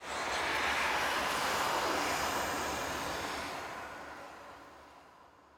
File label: Evil Laughter; bloom minigame